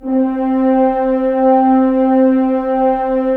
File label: Horns; Roland - LA Composer vol. 4